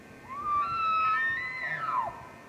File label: bugle, elk